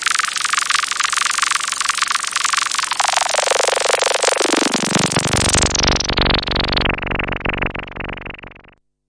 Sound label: noise, Noise Sweeps